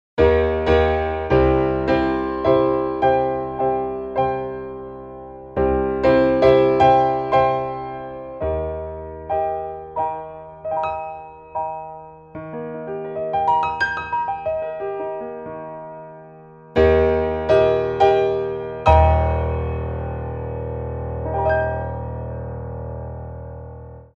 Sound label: Just Chords